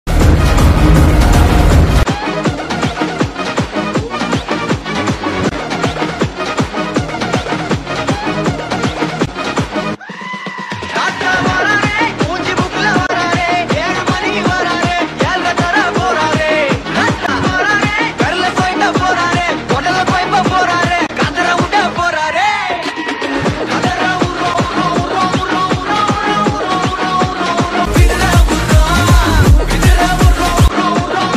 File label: ringtone tamil ringtone bgm